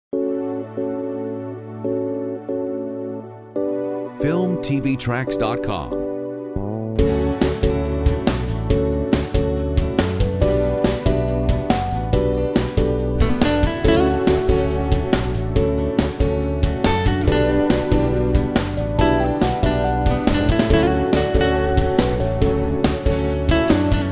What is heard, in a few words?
Sweet summer solo